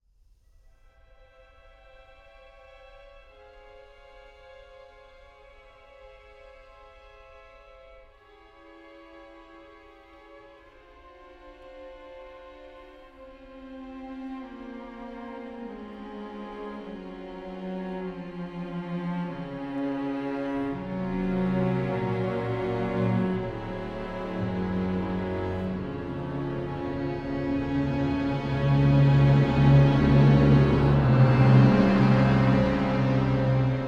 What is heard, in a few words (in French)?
Concerto pour basson; Mvt II